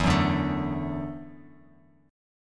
E 7th Suspended 2nd Suspended 4th E down Bass Standard; strummed; chord; JGuitar